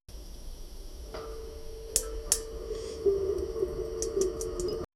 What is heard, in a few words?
grandma humming inside so